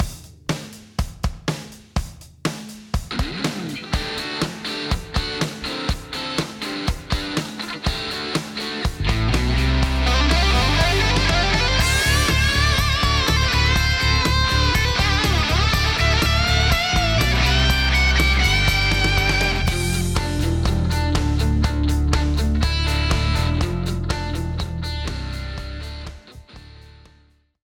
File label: BUS COMPRESSOR MIX unprocessed